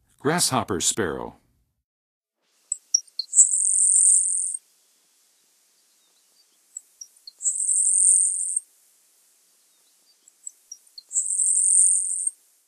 Grasshopper Sparrow, Songs of Birds of North America; Western USA; Western USA Songbirds